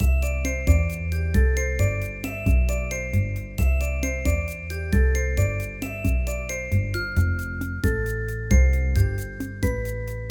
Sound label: Game Music; platform-runner